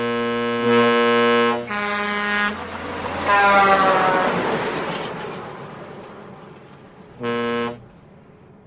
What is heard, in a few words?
truck horns